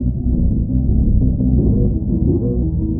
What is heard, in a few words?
FX Messyblob, Drum & Bass; Sonic Foundry (Sony Creative Software); Knutrix Drum & Bass